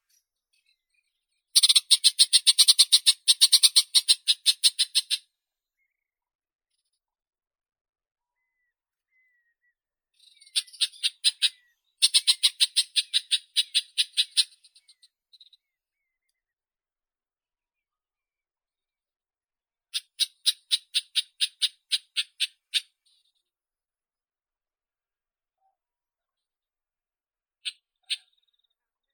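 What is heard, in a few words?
isabelline shrike, Lanius isabellinus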